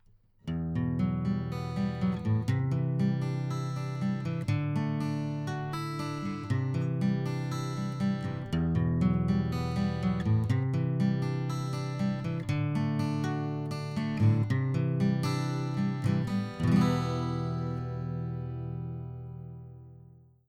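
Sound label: Guitar Supercardioid; Guitar SuperCardiod; Austrian Audio OC818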